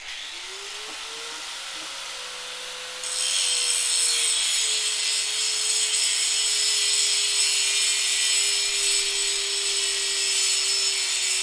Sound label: Angle grinder